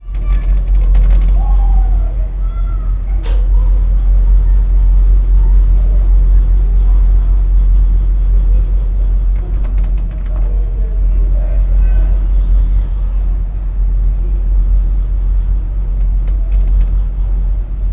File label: transport boat ship ferry onboard engine vibration deep bass rumble people in bg